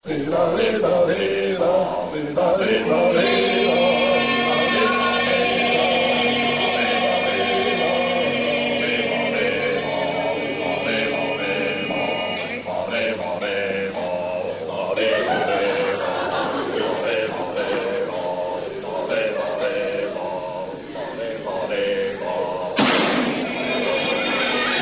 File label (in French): chant